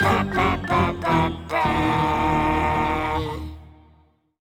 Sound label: Level Fail; Angry Birds Action